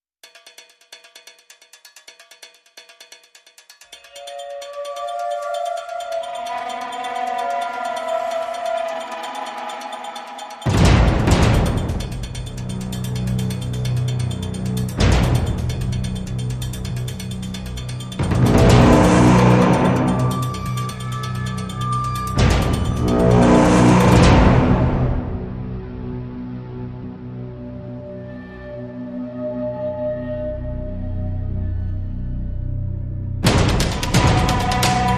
Rhythm and Pads

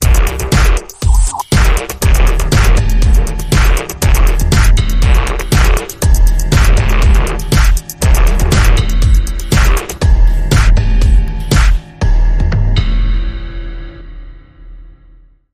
Electro

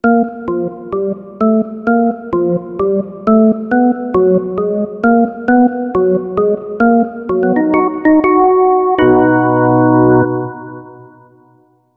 Organ Teasers

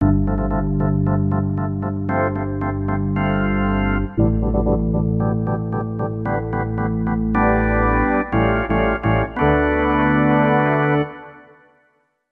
Contest Fanfare

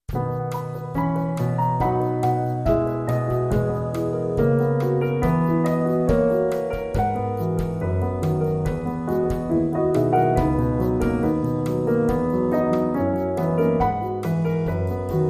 With Trio

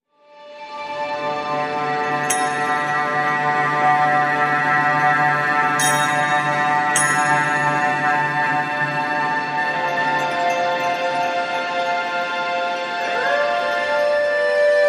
No Guzheng

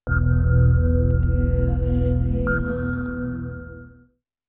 Beat Sting

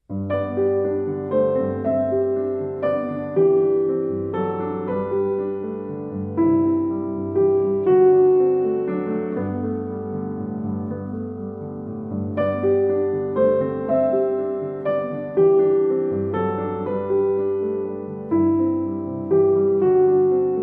Solo Piano

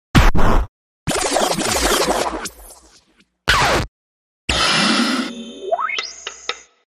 S Scratch Spinback Oops; Cyberwood

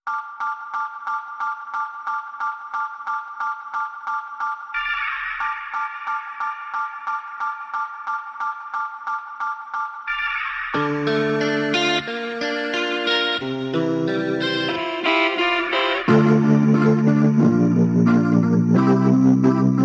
No Perc

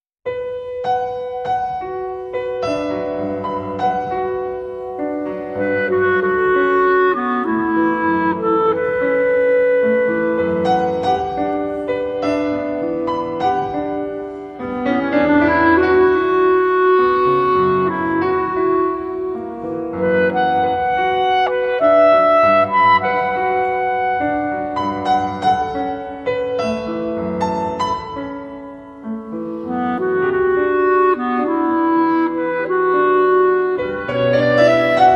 Only Piano & Clarinet

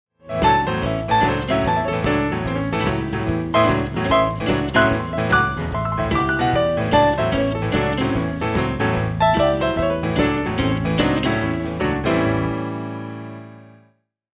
Midnight Shuffle

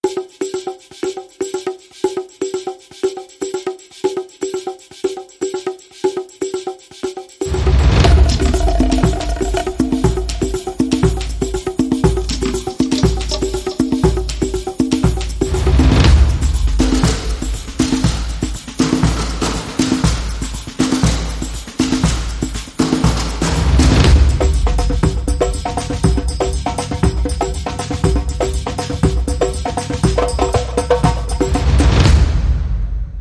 Festive Percs Short Rhythm

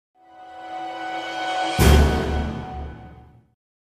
Drama Sting

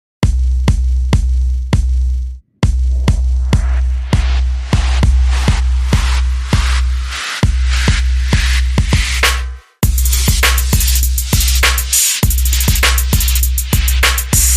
Beat

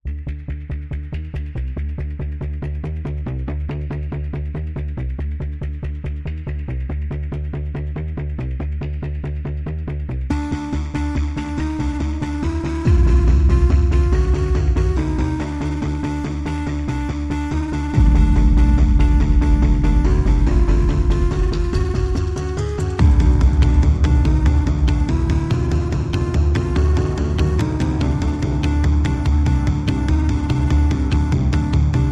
Perc and Pulses Only

